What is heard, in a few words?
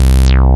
FAT FUZZY, DANCE; Pro-Rec